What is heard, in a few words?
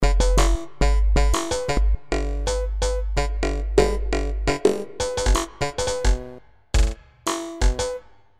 polyphonic